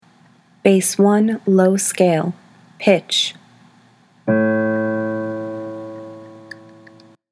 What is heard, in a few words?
bass scales low; all-state choir